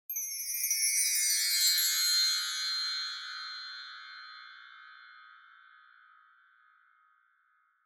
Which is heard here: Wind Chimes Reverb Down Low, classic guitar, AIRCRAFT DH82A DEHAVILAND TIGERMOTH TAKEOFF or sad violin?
Wind Chimes Reverb Down Low